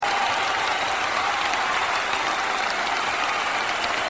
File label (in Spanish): APLAUSOS